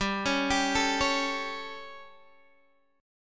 G Suspended 2nd Flat 5th G down Guitar Half Step Down, chord